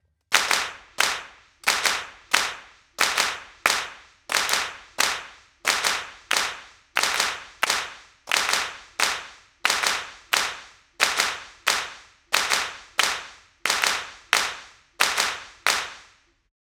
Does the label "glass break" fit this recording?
No